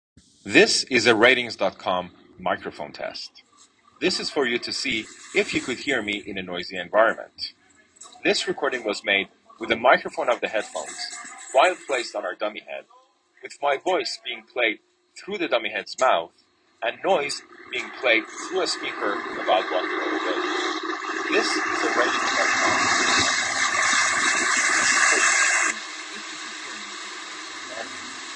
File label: iphone subway